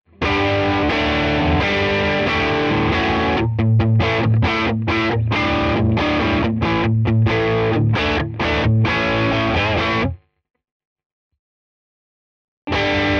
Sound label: TS Crunch Rock Chords